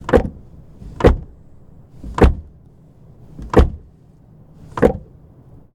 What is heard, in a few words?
Porsche Macan S Foley - EXT door close saturated; mono; Soundholder